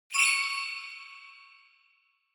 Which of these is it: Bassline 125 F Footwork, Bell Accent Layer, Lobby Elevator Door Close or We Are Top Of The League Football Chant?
Bell Accent Layer